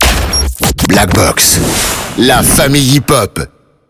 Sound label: jingle; blackbox